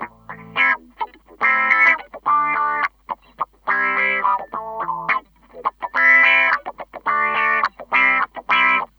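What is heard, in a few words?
SLA GTR2, guitar; USB Soundscan vol.31; Miro s Grooves; AKAI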